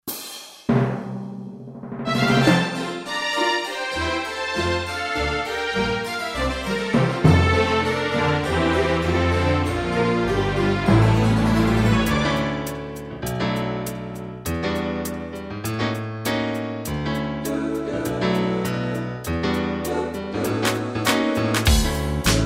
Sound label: sequencer, technics kn7000